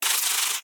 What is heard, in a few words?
Spider Pain; The Inn